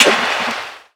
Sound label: Noise Hit; Drums & Drones